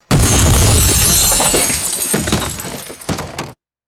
heavy storefront crash with shattering glass and debris; destruction